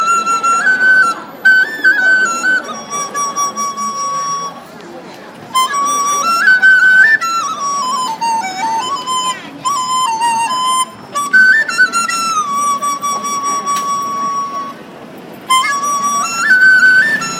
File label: chinese flute